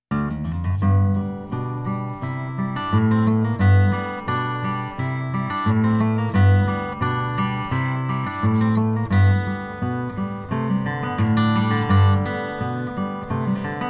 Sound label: intro music